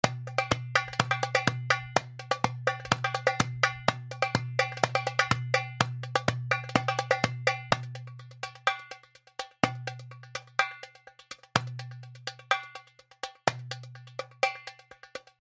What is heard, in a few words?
Darbuka 128; percussion